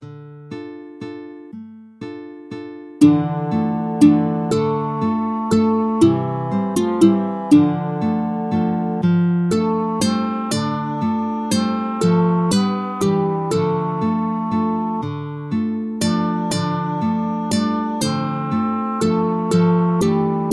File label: Scarborough Fair completa, guitarra